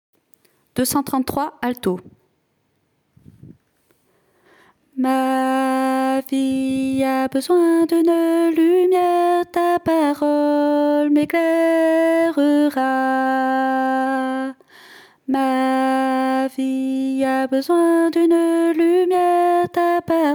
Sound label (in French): alto